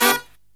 low chord, brass riffs

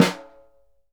SNR, Synclavier Percussion 2 - World & Orchestral

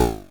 Drums, Hits; DCM8

